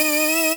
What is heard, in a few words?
Beat